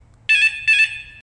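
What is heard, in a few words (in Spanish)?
desactivacion, ringtones de alarmas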